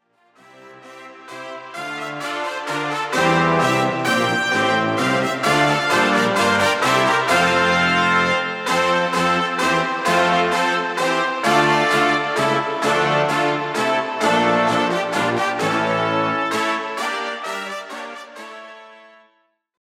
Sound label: brass